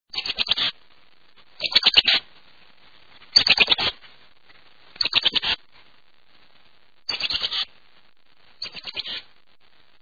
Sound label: Oak Titmouse, songs